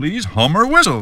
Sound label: VOX HUM, VOX FX; E-Lab; X Static Goldmine 2; akai